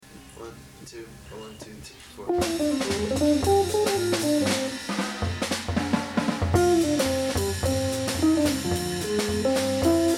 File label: Drum Featurette; Live at AS220 Providence, RI